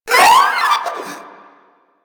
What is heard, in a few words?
Proto Infested vocal short attack